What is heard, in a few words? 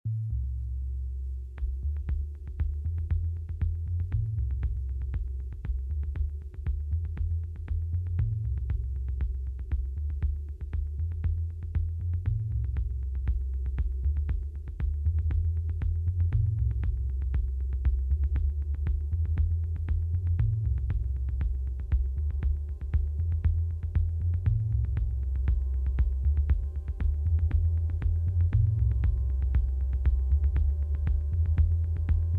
118bpm